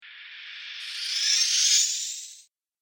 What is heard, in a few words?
Teleport, science fiction